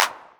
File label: 909 Clap, Novation Drumstation; Novation DrumstationV1